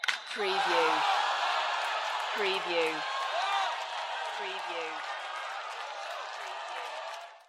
Baseball Hit Crowd; Baseball sound effects. Baseball sports crowd sounds, baseball hitting sounds, running and

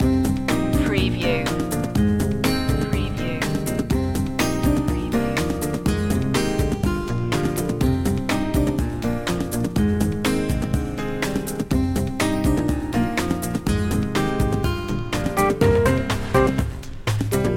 gulf dream loop

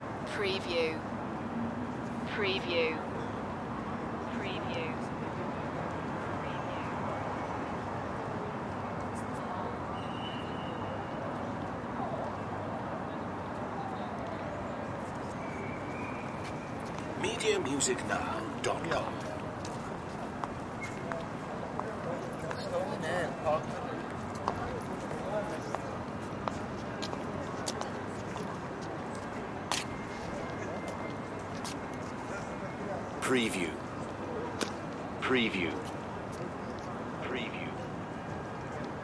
kremlin square ambience

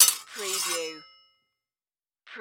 Sword Impact Scrape

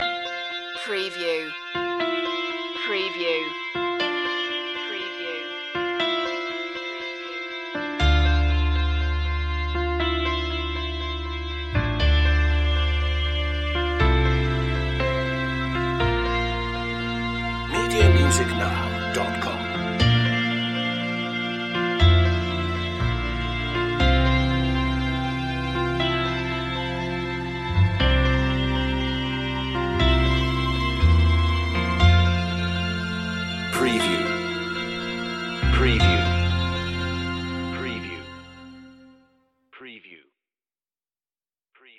piano tv ad

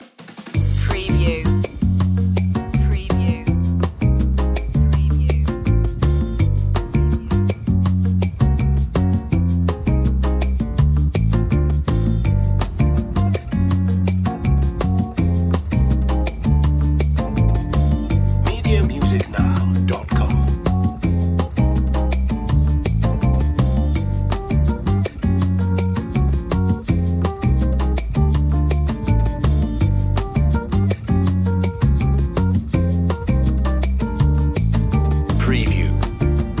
breezy night lounge

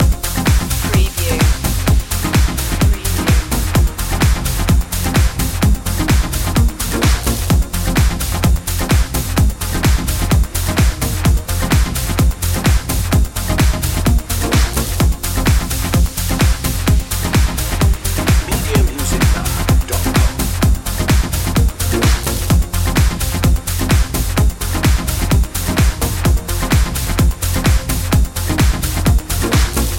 let go loop

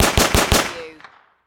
Submachine Gun Sten

